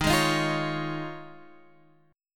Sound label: Eb 9th Suspended 4th, Guitar, Open F; Eb9sus4; strummed